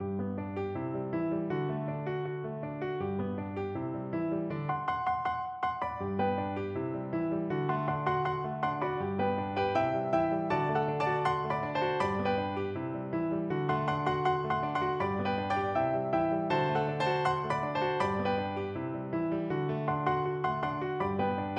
la bamba piano duet

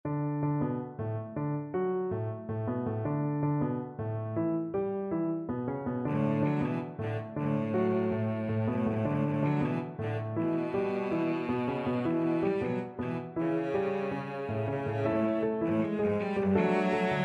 salsa, cello

